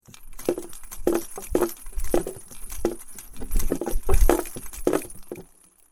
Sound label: ball box